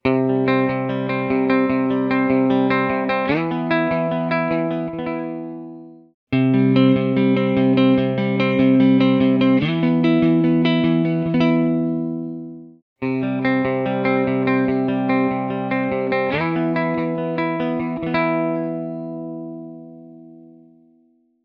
Jam Master JM 1 Picking Clean; pickups

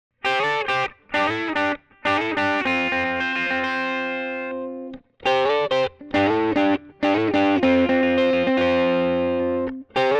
615 Broadway OD, tele style pickups

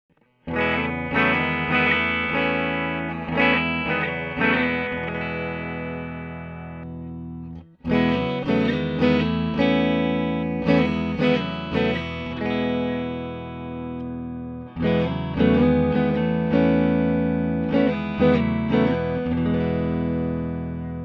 Strumming Clean, tele style pickups; 615 Broadway

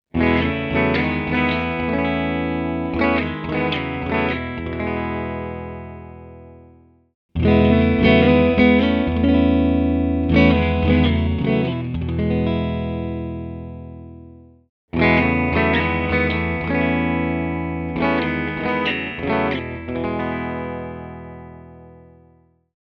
Strumming Clean; Jam Master JM 1; pickups

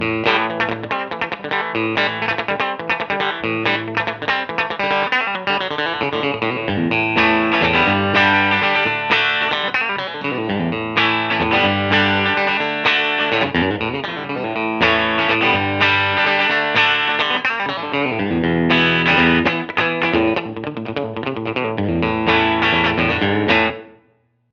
Faux Tele, Strat Pickups; CCS-1

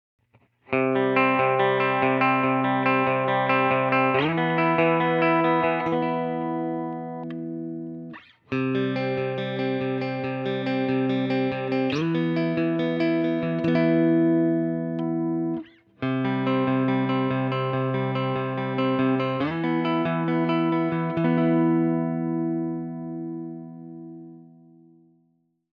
Broadway Picking Clean, tele style pickups

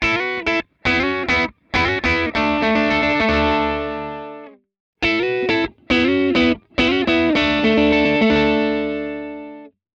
OD, pickups; Jam Master JM 1

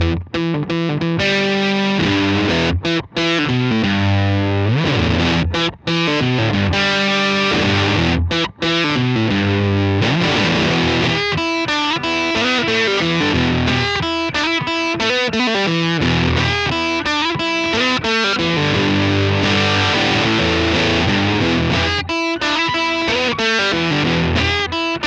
Fuzz Blues; Fuzz Blues Explosion

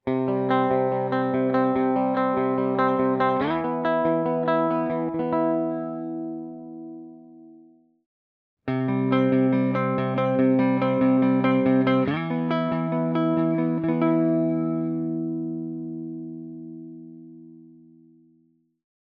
Picking Clean, pickups; P90 style pickups; bridge neck cream color

